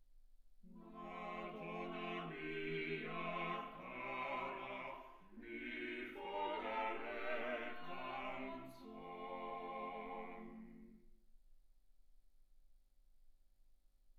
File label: quartet